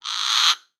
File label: Amb Animals; Red Orchestra Ostfront 41-45